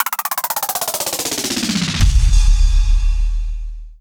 Snare Roll, Trance; Sonic Foundry (Sony Creative Software); Trance NRG VOL-2; Drums

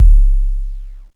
KICK, Hip Hop

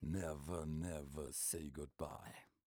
m 130 mel d-flat, Vocals

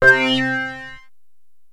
Access Virus B; Synthworx

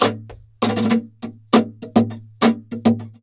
flamenco guitar, duende fx; ProSamples vol.46 - Flamenco

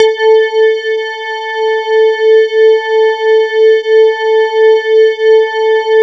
E-HARP, DX HARP; Wizoo - Powered DX

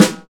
SNR

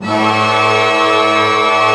PENTA D# FF, orch penta; Sound On Sound; Peter Siedleczek's Orchestra

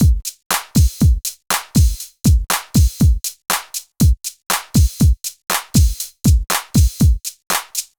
Argon Beat, Drumloops; Dr. Fink's Funk Factory

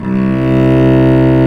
cello, Arco Solo; String Master Series

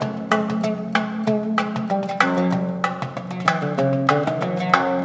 OUD DUMBK, OUD PERC; Spectrasonics; Heart of Africa 2

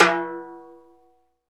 PRC TIMB, Bonus Perc

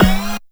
SYNTH, Drum & Bass, 150 BPM; Ueberschall; Drum & Bass Resonance

